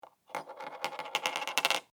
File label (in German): Muenze auf Tisch